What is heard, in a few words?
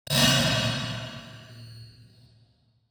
retro sword swipe; soundscrate